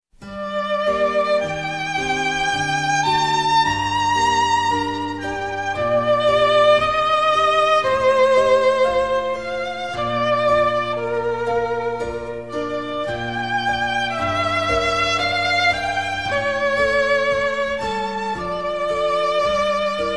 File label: Violin Sonata In G Minor; g小調小提琴奏鳴曲; 第3樂章; Handel; 韓德爾